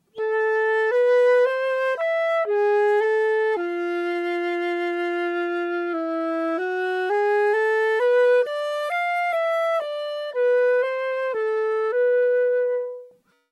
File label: flute; ircam; rossignol